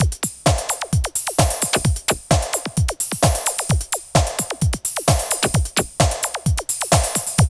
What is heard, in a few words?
LOOP, Tekno Industrial; East West